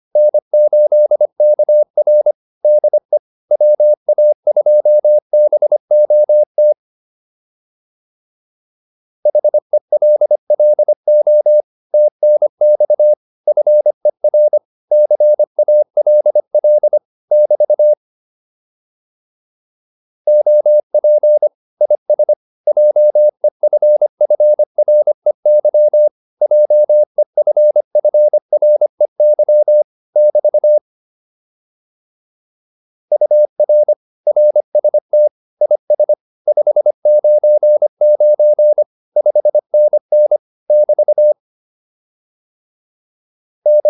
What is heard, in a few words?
QSO, advanced practice files